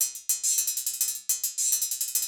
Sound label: 808 Loop Hats 105, Roland 808 Drum Loops, 105bpm